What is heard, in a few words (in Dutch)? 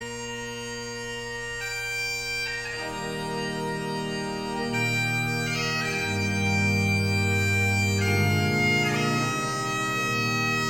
Amazing grace, doedelzak; Scots Guards